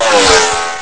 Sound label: car; hardrivin; Amiga Soundtracker Sample Packs